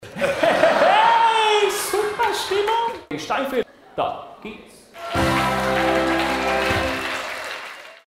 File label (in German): Heeheey Stimmung Da gehts